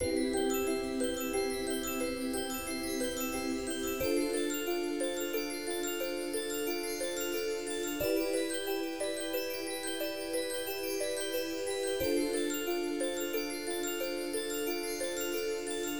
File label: Bgm Chest Open; Cookie Run